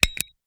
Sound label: click